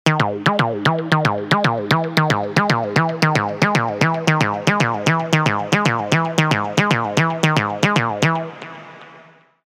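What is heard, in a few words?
Tech Melancholy, 303 sequences; Spire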